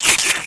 pain, houndeye; gearbox

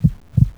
heartbeat; silent hill

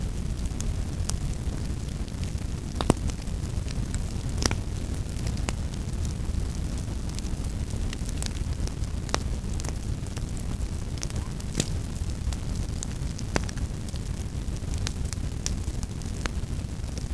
firecamp

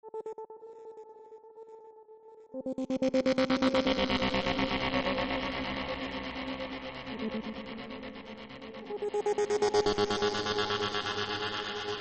ambient

